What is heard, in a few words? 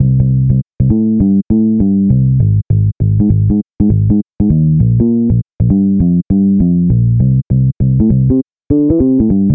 Gospel